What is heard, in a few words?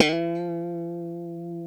F#3 FUNK SLD, GUITARS, FUNK STRAT; Spectrasonics; Hans Zimmer Guitars VOL-1